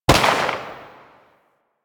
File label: Uzi Pistol Shot, weapons; Grn Uzi Pistol